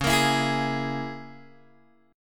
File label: C sharp Minor 6th C sharp, Guitar Open G